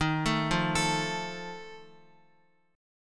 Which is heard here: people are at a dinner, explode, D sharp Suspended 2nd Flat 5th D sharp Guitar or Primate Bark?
D sharp Suspended 2nd Flat 5th D sharp Guitar